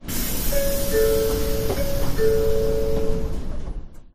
bullet train door

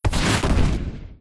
shoulder throw

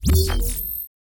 menu, button

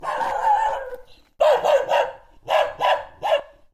dog

